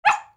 puppy

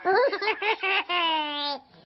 peekaboo, baby

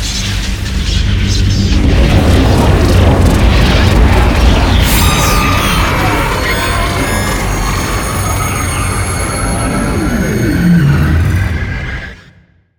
shutdown; CosmicRage; activities moon

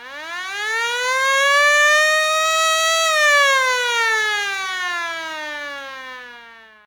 siren, ships; waypoints

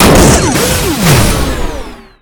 build, factory

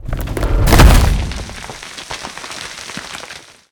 step1, megasuit; combat; CosmicRage

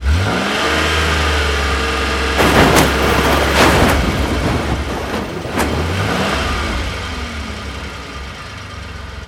flip out, Cars; CosmicRage

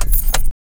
key, Cars; CosmicRage

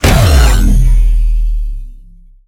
hurt, cylarkan, creatures; combat; CosmicRageSounds; larkan sound improvements

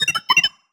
beeps; cy-larkan baby fortify command; CosmicRage; CosmicRageSounds; Added sounds for the cy-larkan baby fortify command.

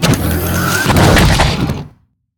lift door close; house; CosmicRage

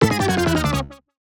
bingo lose; general bingo

